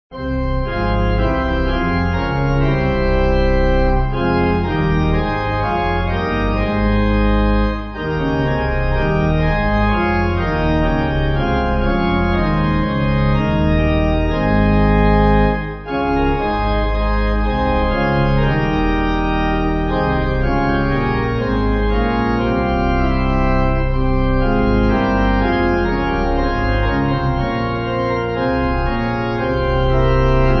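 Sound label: Pipe Organ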